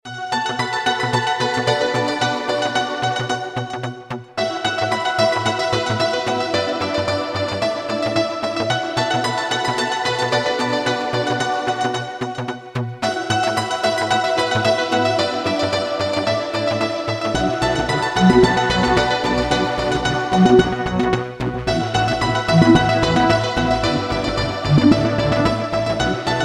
piano bass

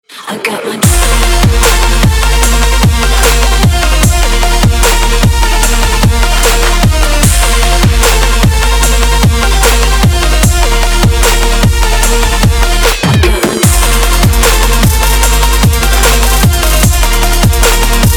drum machine

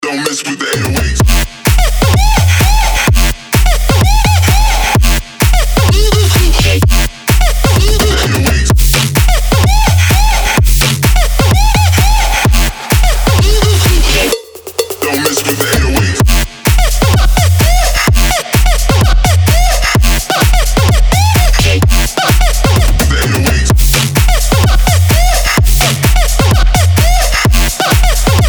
tv noise 808

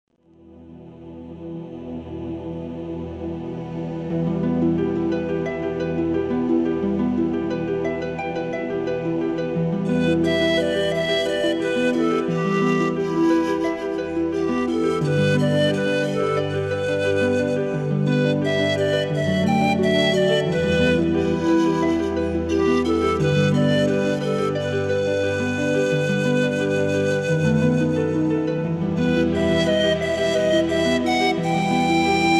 ocarina

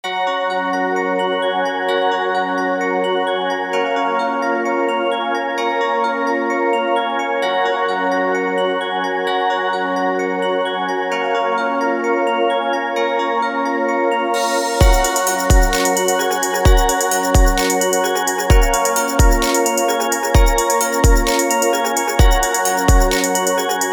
FL Studio chillout

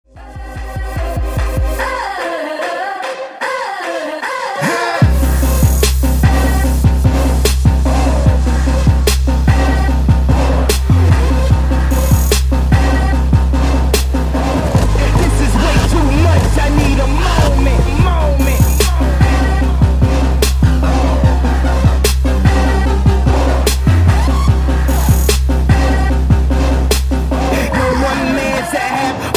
trap

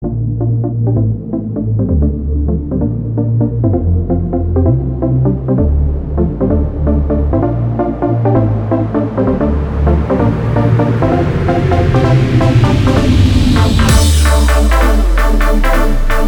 trance mix

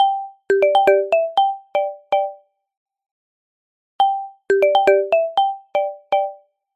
samsung galaxy s6

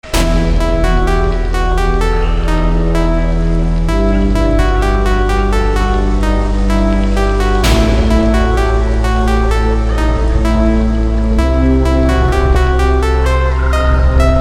horror

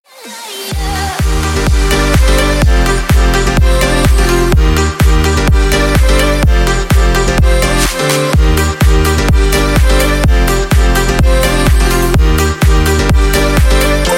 electro

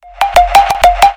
sms ring; opoveschenie ob sms

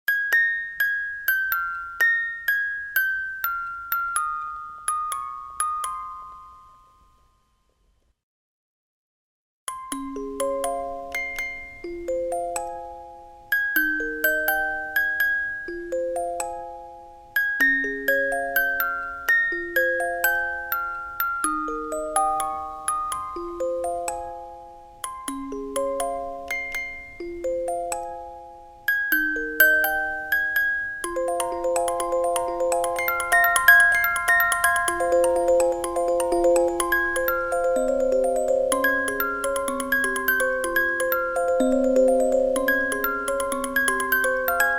music box

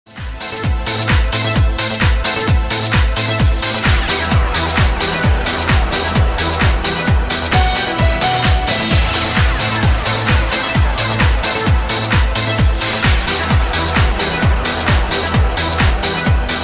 space radio mix

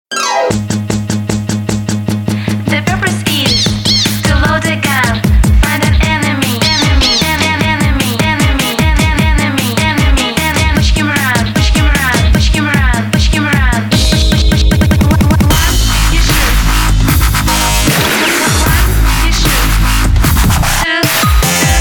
energy loop

